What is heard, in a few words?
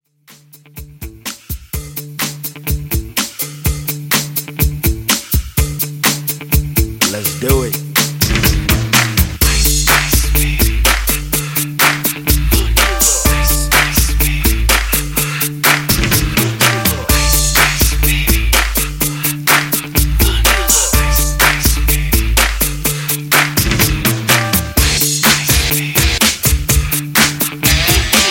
Party Mashup 125 Bpm Clean